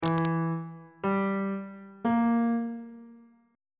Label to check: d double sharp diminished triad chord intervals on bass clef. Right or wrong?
Right